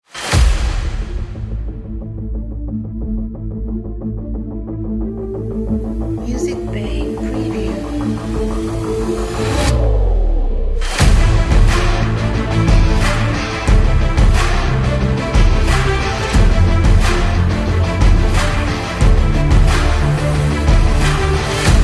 Sport Epic Trailer

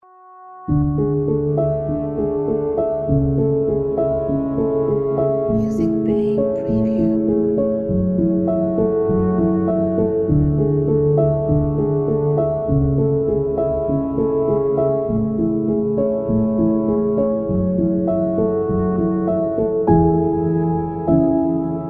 Wonderful Piano

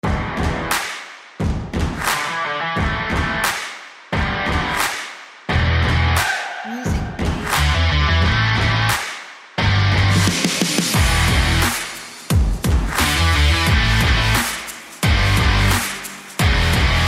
Heavy Stomp Rock

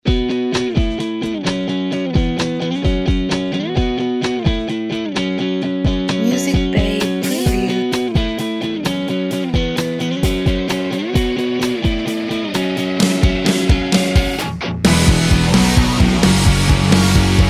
Modern Indie Rock

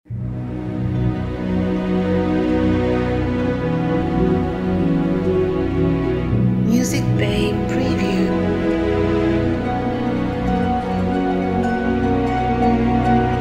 Beautiful Symphonic Logo

